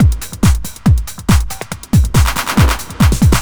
TECHNO BEATS 140

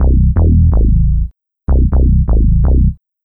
DEEPHOUSE BASS 125 A

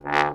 Trombone staccato D1; Orchestral; DirectWave; Varazdin Orchestral

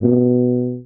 Contrabass Tuba Straight B1; DirectWave; Varazdin Orchestral

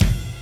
Ride; Touch Loops; ClassicBreakSessions

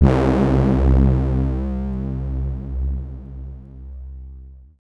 SNTH BASS ELCTR 140 A, Techno

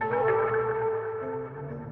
ethno, 125, A

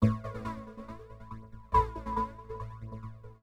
TEKNO 140; Techno2

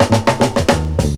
break bass 102, drum; BREAKS

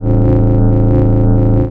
TECHNO FX 140

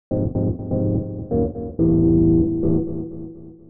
DEEPHOUSE ORGAN 125 A

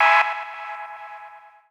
KEY SHOT TEKNO 140 A; Techno2; Singleshots

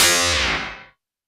SPACE FX 140; SpaceSounds

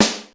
SD, snare drum; SNAREDRUMS

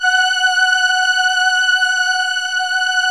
VP330

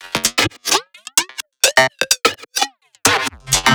drum loop 128 Perc; Anthemic

